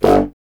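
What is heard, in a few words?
wind contrabassoon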